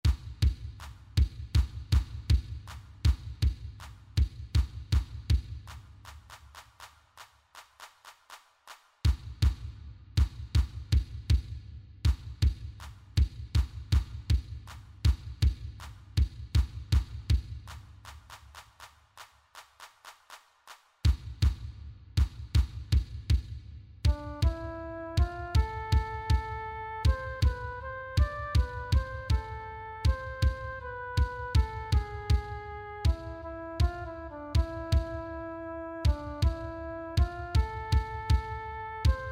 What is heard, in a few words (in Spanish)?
TAMBOR; Danza hebrea